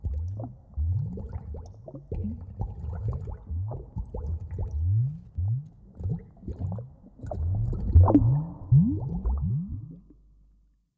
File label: Bubbles